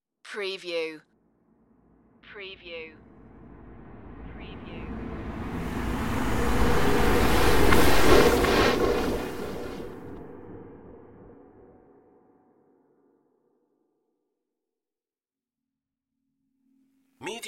power glitch flyby

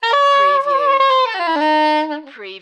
Sax Riff

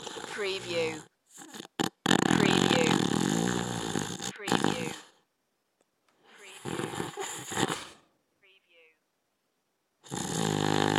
Man Snoring

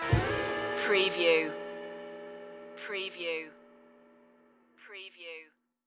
fish fry blues stinger

